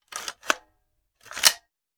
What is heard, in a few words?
Machine Gun Foley; Sound Ideas; Guns